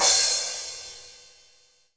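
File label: cymbal, right; Best Service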